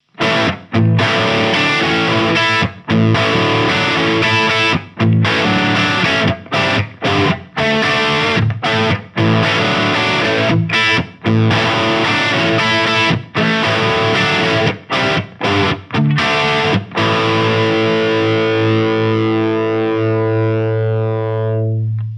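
Orange Rockerverb 50H MKIII Les Paul Crunch Pentode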